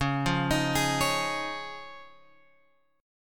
C sharp Major Add 9th; C#add9 Chord {9 8 X 8 9 9} arpeggiated

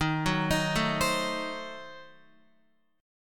Eb Diminished 7th, Guitar; Ebdim7 arpeggiated; x,6,6,8,9,8; 4F,4A,5C,5G,5C,6E; JGuitar

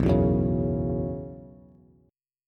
B Suspended 2nd Suspended 4th, Bass; strummed; JGuitar

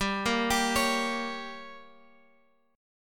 G Diminished, Guitar, Open F, chord; Gdim arpeggiated; 19,17,19,20,x,x

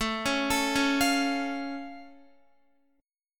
A Augmented A down Guitar; arpeggiated; JGuitar

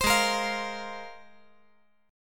Ab 6th Add 9th Ab; strummed; JGuitar

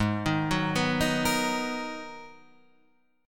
G sharp Minor 9th G sharp; G#m9 arpeggiated; 4,6,4,4,4,6; JGuitar

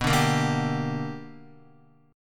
B Minor Major 7th Sharp 5th Guitar; strummed; JGuitar

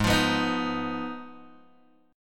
Ab Minor 6th Ab, down, Guitar, Open G

